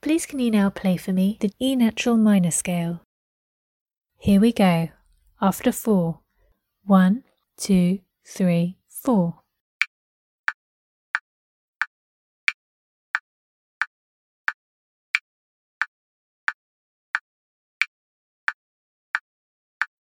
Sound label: e nat minor scale